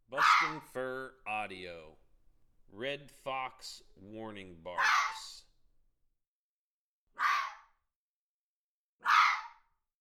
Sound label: Red Fox Warning Barks